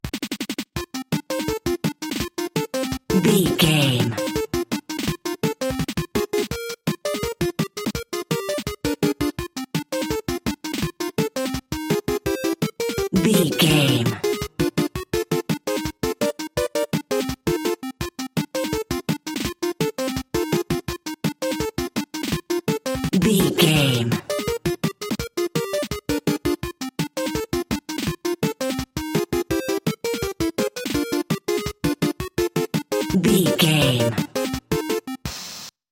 fight club 8bit version; Video Game

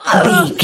voice female hurt pain choke

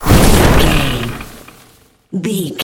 nature spell vines storm

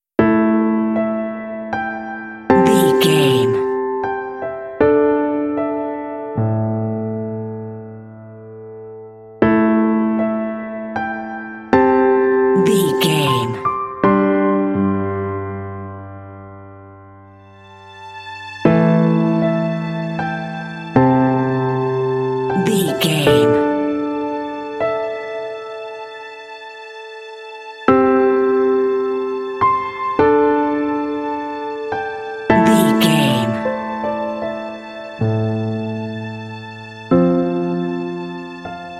piano film score theme

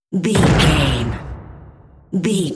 cinematic drum hit trailer

